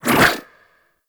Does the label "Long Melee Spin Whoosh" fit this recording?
Yes